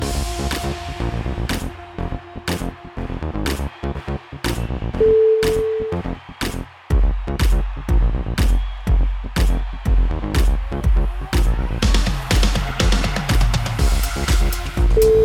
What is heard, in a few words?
air alarm